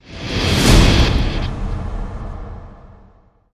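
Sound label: sting, guitar drum hit; transformers war for cybertron gamerip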